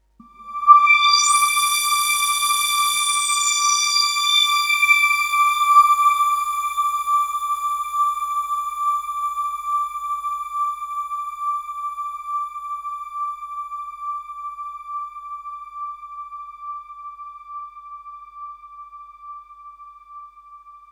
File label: Oneshot Synth; Zombie Army 4 Dead War